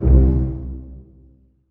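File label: pizzicato C1 mezzo forte right, string; Sound On Sound - Peter Siedleczek's Orchestra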